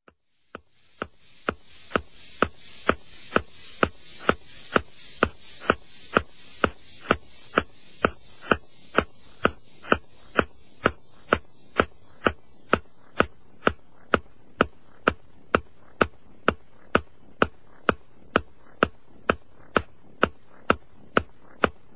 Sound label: deep space mix